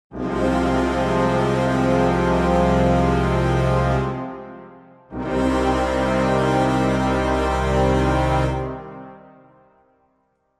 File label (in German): Brass Ensemble Sustain tiefe Instrumente